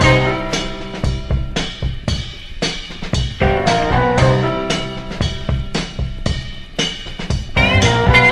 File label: piano incoming, drum loops funky